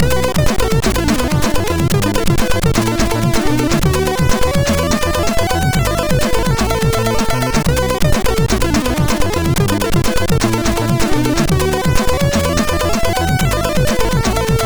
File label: Double Impact note, sid MOS6581R2